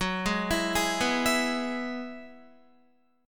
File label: Gb 7th Suspended 2nd Suspended 4th Gb down Guitar; Gb7sus2sus4 arpeggiated; 4E,4A,5D,5G,5C,6F